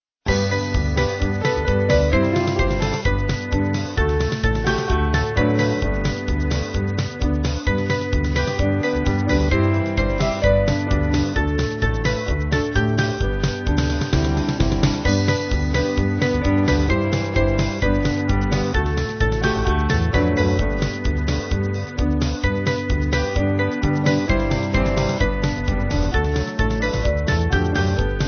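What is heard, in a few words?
Band, Music Backing Files for Churches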